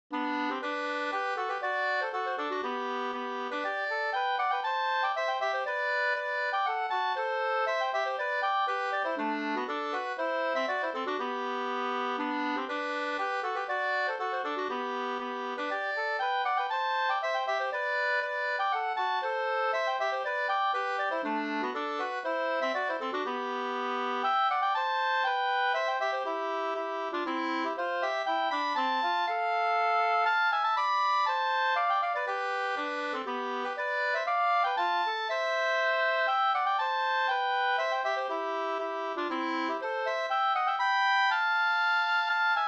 polska Bodapolska

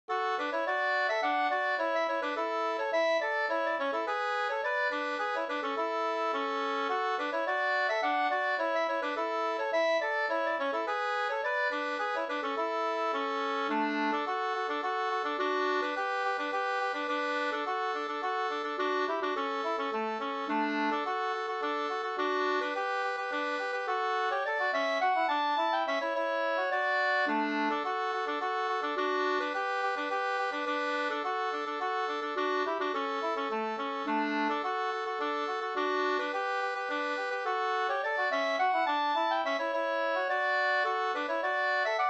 slängpolska

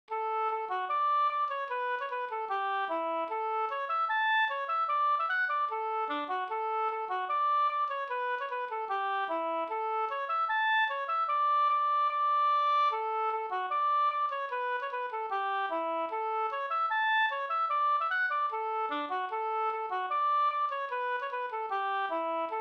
schottis 4takt; Jernbergs schottis av Gustaf Jernberg Gs

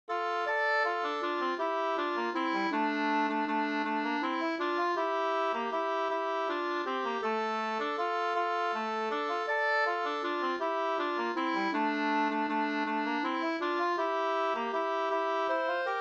vals valssi

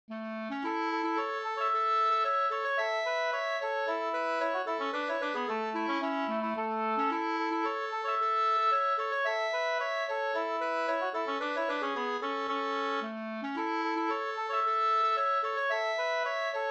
slangpolska

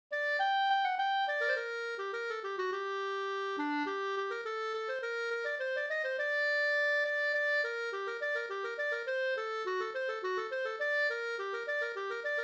polska Brudpolska

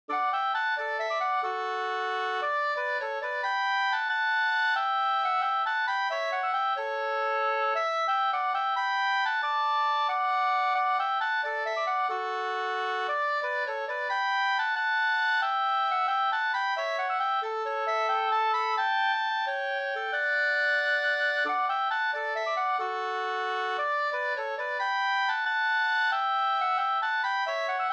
ganglat utstallningsmarsch

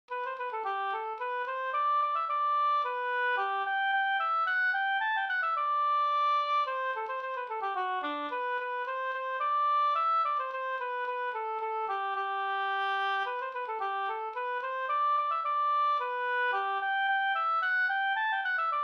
slangpolska; Loftahammar

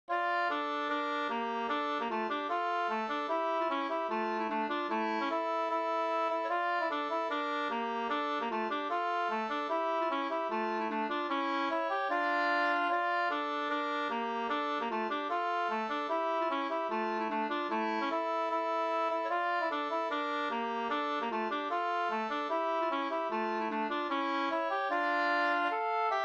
schottis Norsk schottis; e Myr-Hans Hs 2st V1

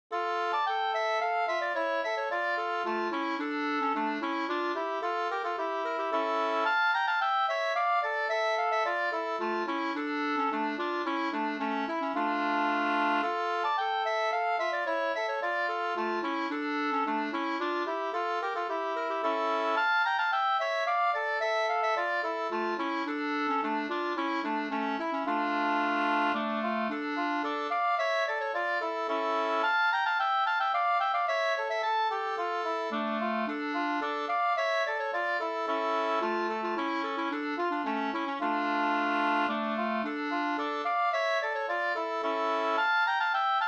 polska senpolska; Fabodpolska; Carl Pettersson Njurunda Mp

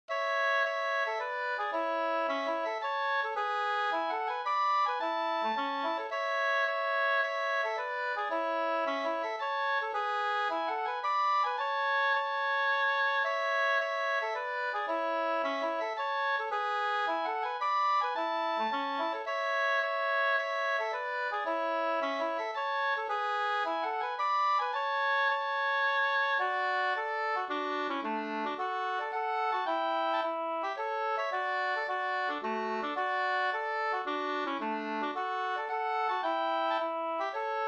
polska Bodapolska

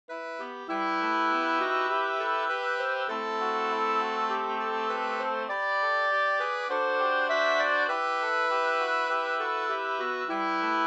vismelodi; Allt under himmelens faste

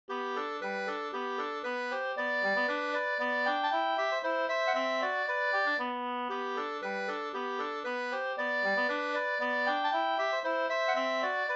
slangpolska 2st

